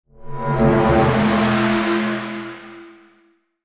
Metallic Transition With Breathy End, scary soundscape; Crafted Signals